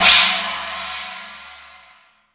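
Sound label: STEREO CHINA, CYMBALS; CYMBALS 2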